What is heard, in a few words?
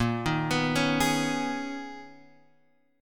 B Major 13th A sharp